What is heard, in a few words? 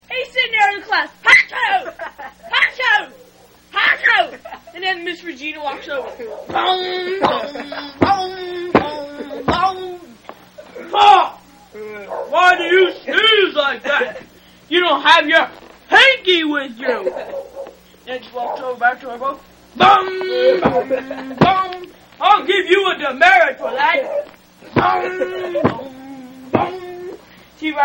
sneezing